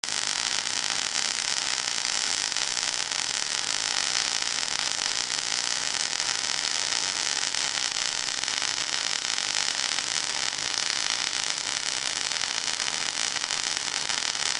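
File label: zvuki toka, electric current sounds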